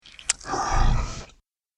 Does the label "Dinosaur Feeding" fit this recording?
Yes